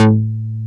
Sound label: DASH A2, BASSES